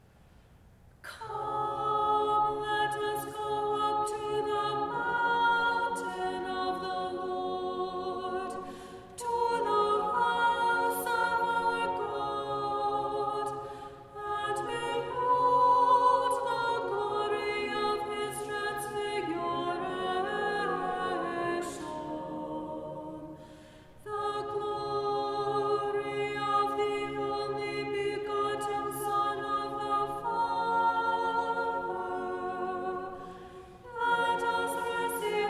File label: Tone5 Kievan Chant Sticheron Example Soprano